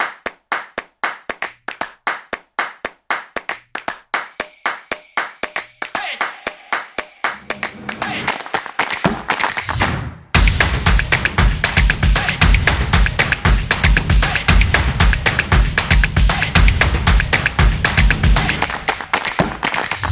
Drums Dynamic; football promo

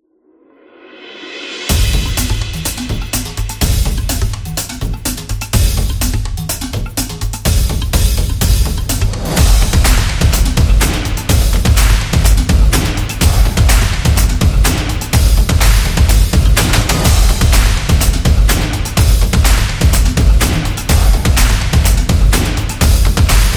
Beat; drumming action

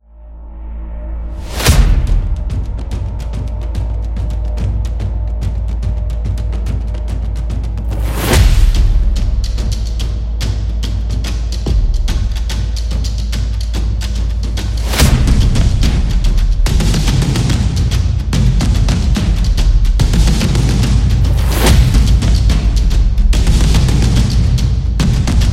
The Tribal, tribal dance

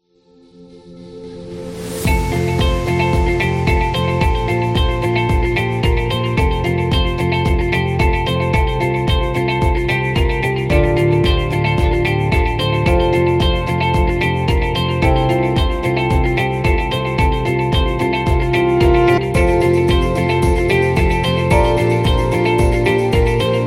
Study; corporate music vol 16

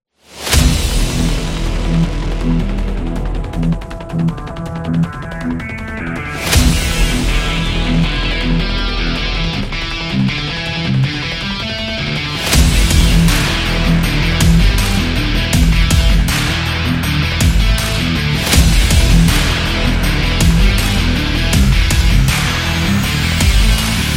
Trailer Rock Epic

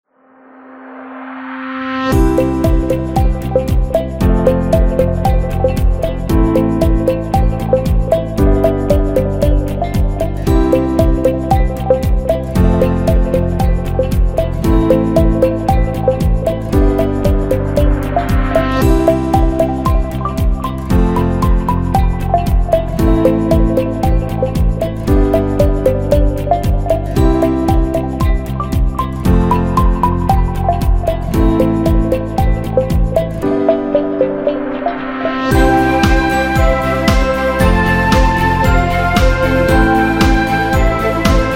Uplifting Flute; corporate music vol 4

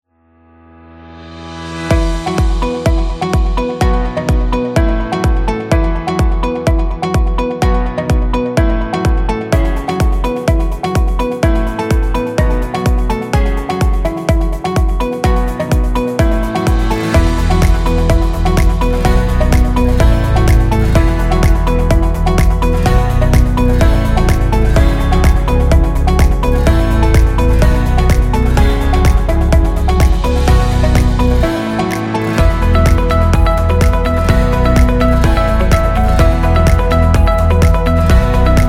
Luxury Beat

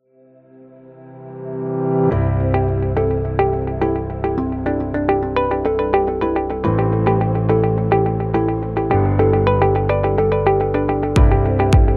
Office Background; motivational speech

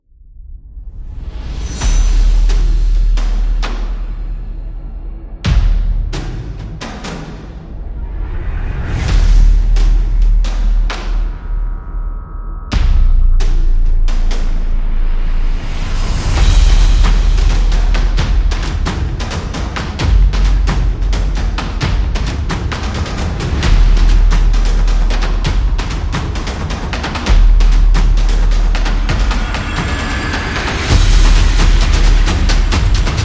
The Drums Music Tribal Percussion; tribe

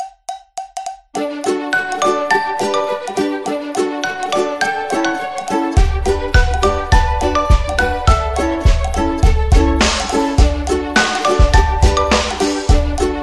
Happy Birthday Music; happy music vol 3

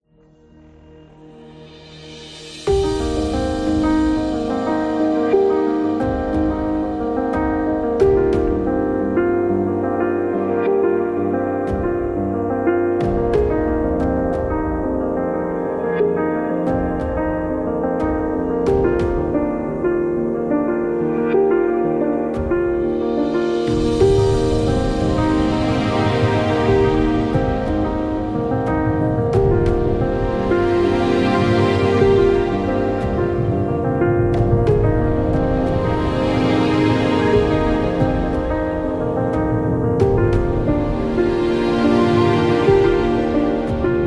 Chill; epic action

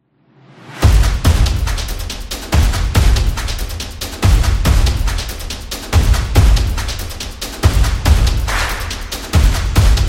Drum Beat Dynamic Music

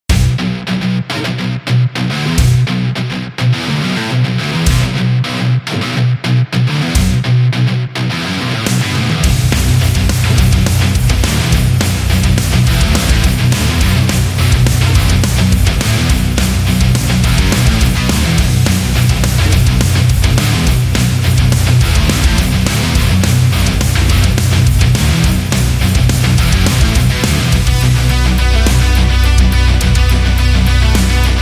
For Metal

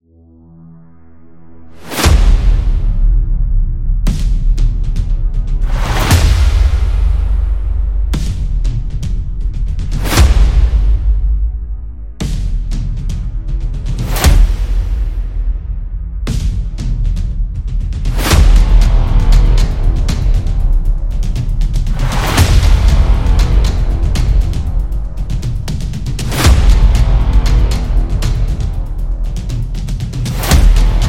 Drums Epic Trailer

